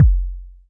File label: Kicks, Elektron MachineDrum SPS1 MKII